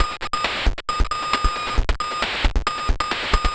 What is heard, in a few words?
Drums Strange